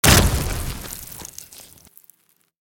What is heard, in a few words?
Rocket Blast Death; The Binding of Isaac; SFX Isaac